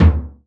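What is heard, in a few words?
bass drum hard; Northstar - New Gold VOL-2; 50S BRUSH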